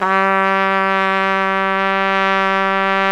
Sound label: solo trumpet; Zero-G; Phantom Horns